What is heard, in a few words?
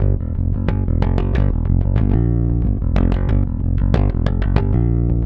Rock, Bass; Bass X